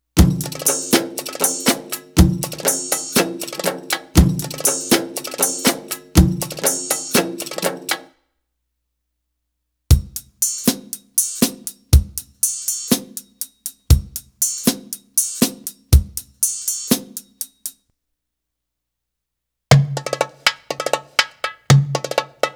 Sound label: Arabic Rhythms